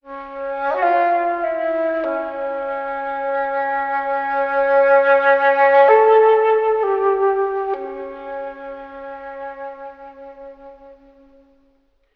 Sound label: flute, Loops, Sad